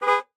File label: Retro Car Horn, notifications; Samsung; Galaxy A56